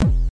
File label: Commodore 64 SID kick, 8bit SID Drums; LO FI and 8 BIT KITS